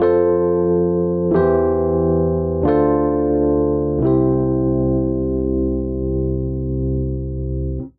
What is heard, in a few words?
Cadence Descending C Major 90 bpm, Electric Keyboards; MIDI Clips; Ableton; Factory Packs